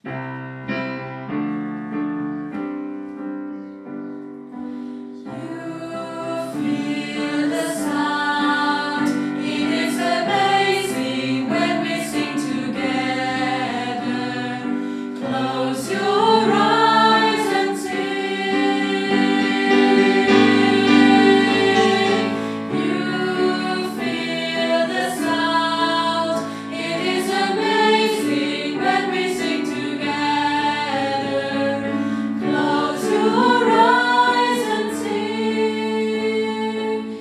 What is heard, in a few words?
audio tutti; inner singin